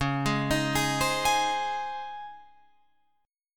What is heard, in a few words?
Db Major 7th Suspended 2nd Db Guitar Open F; 13,15,15,15,15,15; DbM7sus2 arpeggiated; JGuitar